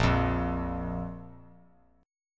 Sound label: Bb 5th; Bb5 strummed